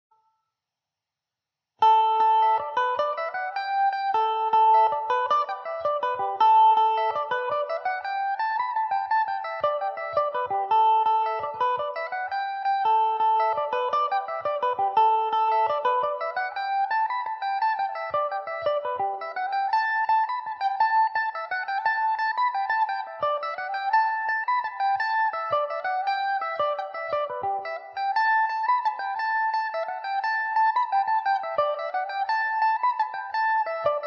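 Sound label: drimcong jig A dorian, irish mandolin; Mandobird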